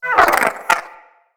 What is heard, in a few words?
Wyrm Idle, Pets, Vocals